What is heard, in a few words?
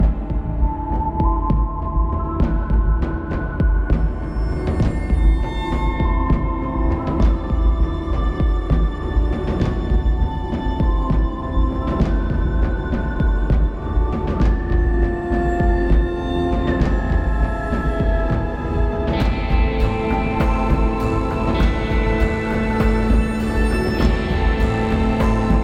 Western Low Threat Loop